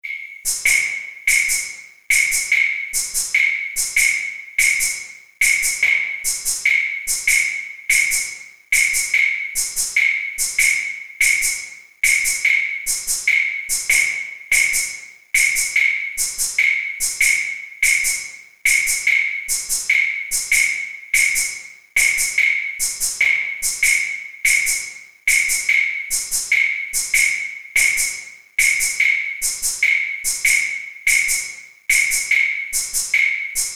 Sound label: claves